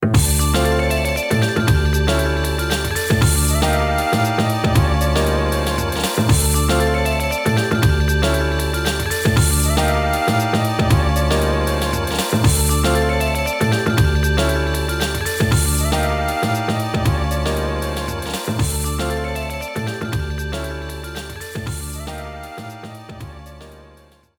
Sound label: Winning Theme; Vampire Chronicle for Matching Service; Dreamcast; Gallon